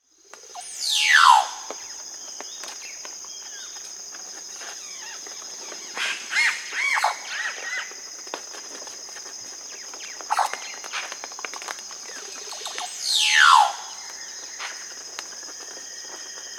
Casqued Oropendola (Psarocolius oseryi), Peru, Birds of the Amazon; S. America